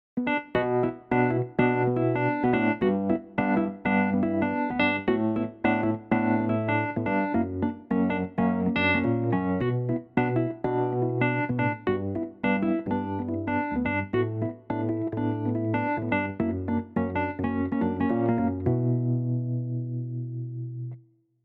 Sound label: Electric